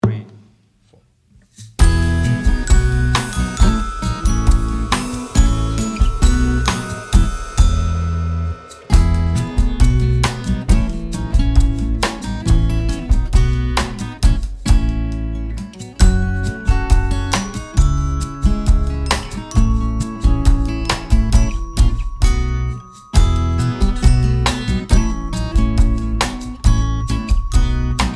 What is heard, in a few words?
perfect tense instrumental